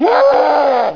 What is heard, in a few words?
FLEE